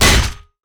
basic attacks; LoL; vi

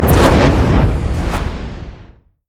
summonerspell base exhaust; LoL

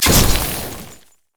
basicattack; Yasuo

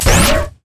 crit attack; rumble skin03

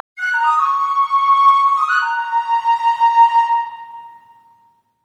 tidalwave flute; nami koi

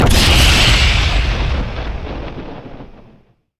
spectral; LoL; yorick

